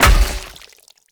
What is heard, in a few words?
Polearm Large Impact Flesh; Weapon Impacts; Enemy